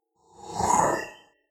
swell short haunted; sweeps-swells

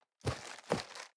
cardboard box medium turn hard short; objekte

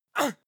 male moan pain short soft; koerpergeraeusche; menschen; soundpool

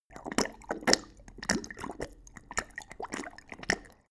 dog drink calm; natur-tiere; hunde

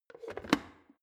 plastic water boiler lid on; soundpool

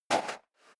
step leather shoes sand single left

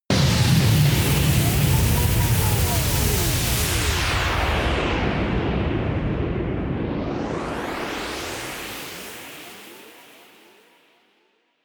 storm vortex, drones

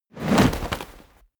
coloss movement swing whoosh; kreaturen

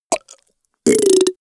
goblin wet burp nasty; koerpergeraeusche; comedy-cartoon; soundpool

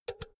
power cord heavy insert remove unsteady; objekte; elektronik

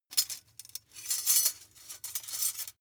midsize metal thin handle

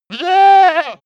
goat baa

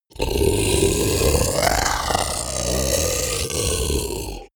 beast grunt, comedy cartoon; kreaturen; soundpool

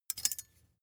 thin metal boing vibrate short; industrie wirtschaft; metall

